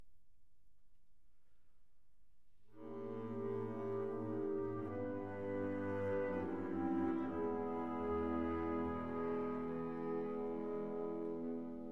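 double concerto for bass trombone trumpet and band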